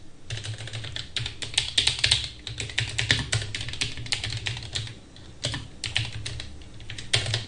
asmr teclado

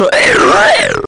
eruto intenso

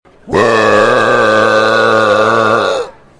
eruuuuptooooo

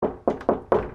toc toc toc puerta

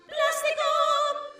plastico

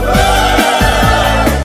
indio oooooooooo

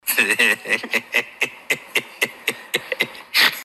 risa

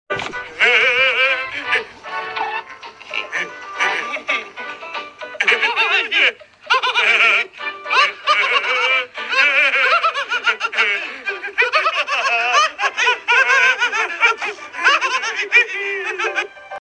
risa de tontos

